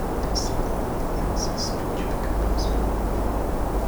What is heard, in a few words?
beam steer off axis